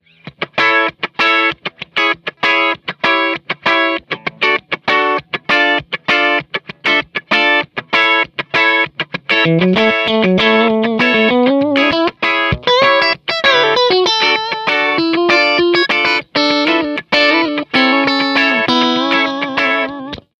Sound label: funky rhythm